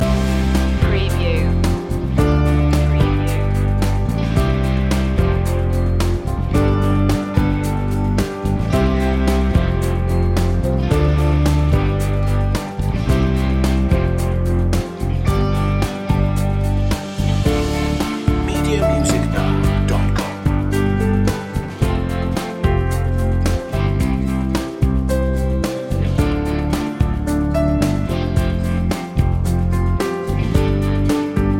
easy drive loop

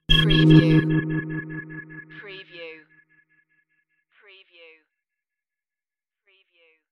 interface flutter hit

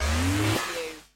Space Cut

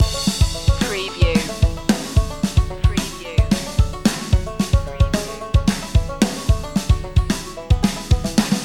power pop goes bang loop

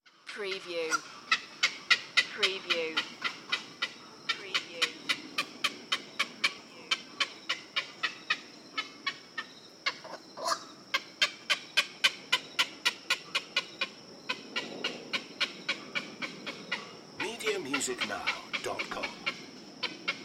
Wetland Black Heron; Sound Effects Bible

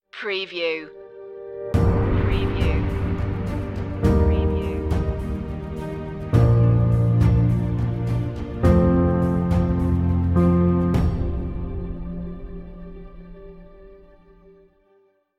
escapism stinger

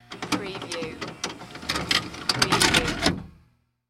VCR Eject Tape Short

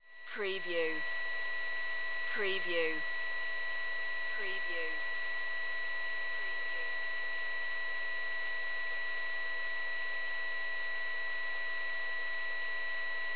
Computer Screen High

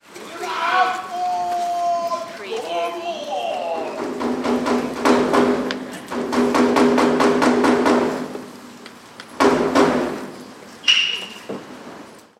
Kabuki theater drum male storyteller Tokyo Japan

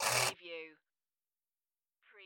Pinball sound, motor or crane move

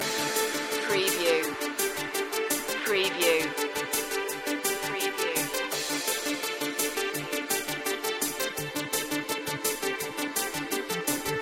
astronavigator loop

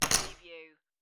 Poker Chips Drop Two Chips On Wood Table

